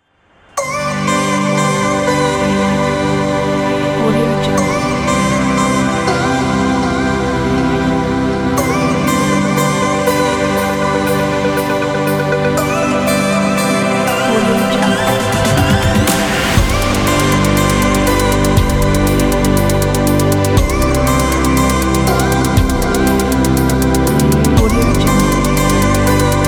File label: soundscape chill